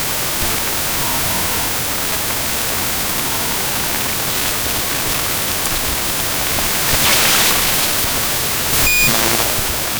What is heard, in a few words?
abrupt communication sign